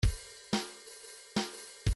swing 1 bar

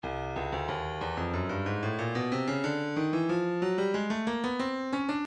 breakbeat midi files 092 093 bpm; Stylus; Progresso

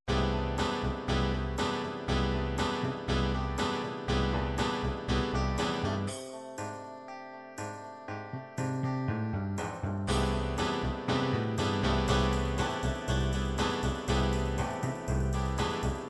ballads ballad guitarpop, midi

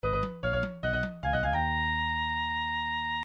victory; nintendo mega man iv

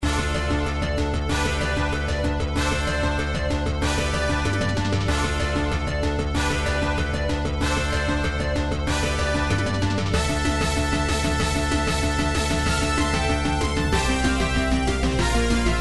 Super Nintendo intro stage, midi; mega man x2; sword bolt

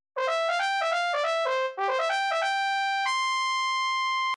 arena fanfare; super nintendo secret of evermore

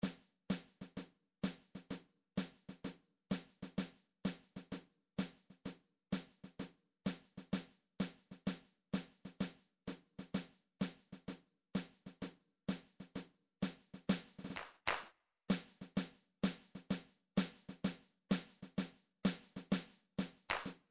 drums blusbrsh

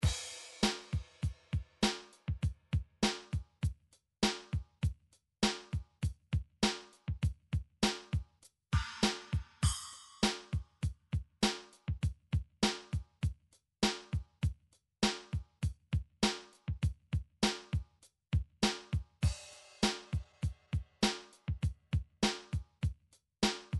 drums 90s pop, midi drums

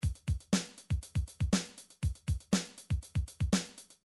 midi drums

